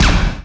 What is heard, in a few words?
shoot splinter; Drunken Robot Pornography; Inago Rage